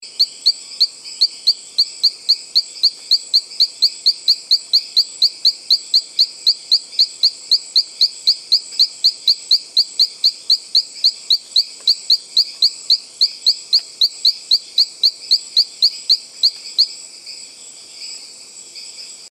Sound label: Allobates masniger